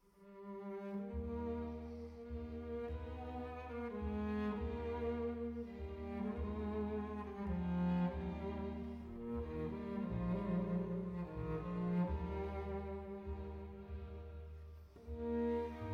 Sicilienne in E-Flat Major, Cello & Chamber Orchestra; Arr. T. Miteva